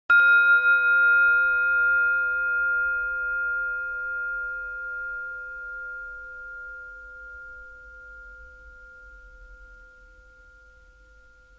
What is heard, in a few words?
Ding, meditate